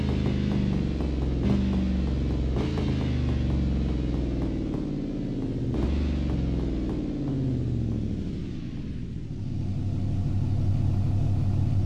throttle off, vehicles; ambulance

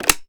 reload empty mag in; weapons; gmod; viper

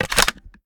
reload end load; gmod

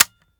fire first; gmod; viper

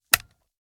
ar selector on; weapons; gmod; viper

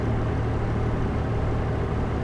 idle, vehicles; c32

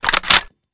sn rechamber; gmod; iw8; alpha50

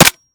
weap fire last mech; weapons; gmod

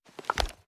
ar akilo47 reload end; weapons; viper

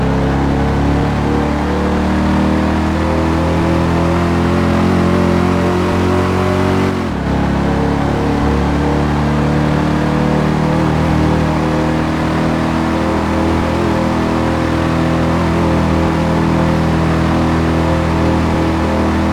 fourth cruise, vehicles; typhoon

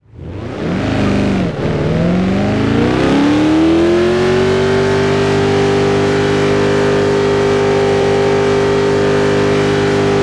first, vehicles; gmod; tdmcars; raptor svt

